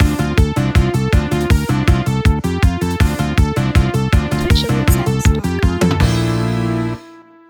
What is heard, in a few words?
New Wave Raid Alert